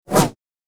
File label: Double Bladed Saber Sound; FXHome